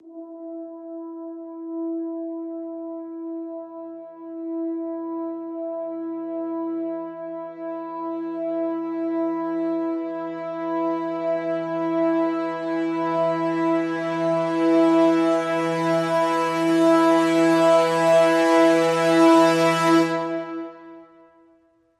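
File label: Crescendo Brass Swell Hi (E)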